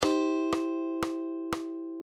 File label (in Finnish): C duuri bend